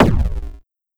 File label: explosion